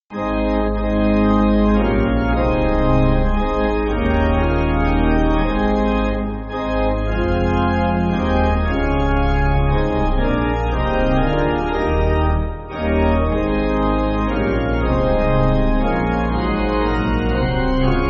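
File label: Pipe Organ, Backing Files for Churches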